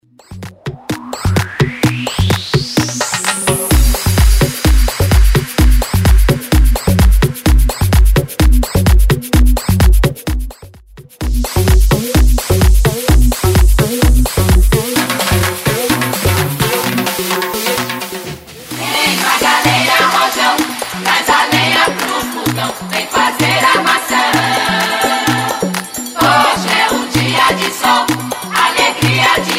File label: Bootleg Smashup 128bpm